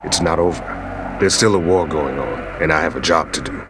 death; blade

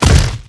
impact, hound; zaero; monsters

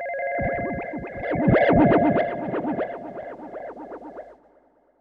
phone scratch; baseq2

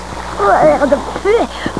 gurp

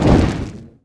large fire impact; spells

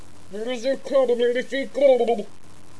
death; frosty

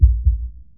heartbeat

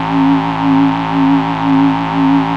ps active, plasma shield; zaero; items

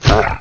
bump; opentdm

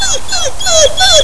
pain; openffa; Fwwolf

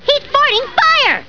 fart fire; lmctf

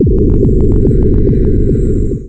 haste

